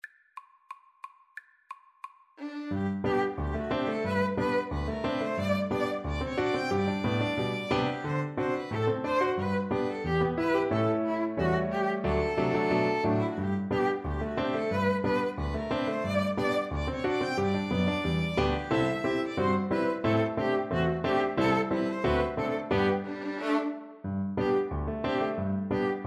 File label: Piano Trio